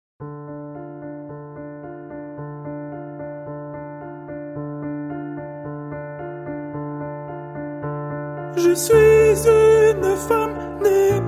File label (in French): TUTTI